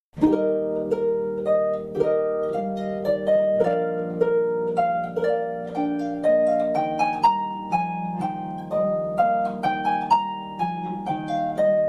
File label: Allegro Vivace, Harp